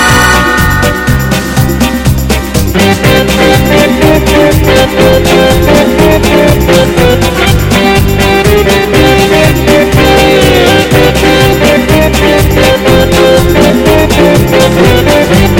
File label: Hot and Smooth